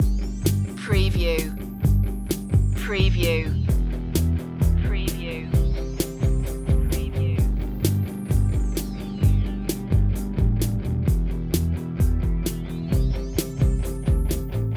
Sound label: break out loop